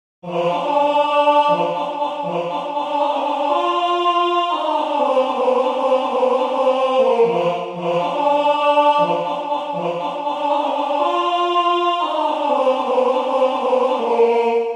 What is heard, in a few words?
tenor, chorale